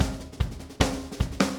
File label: 150 Ska Drums; Pop Rock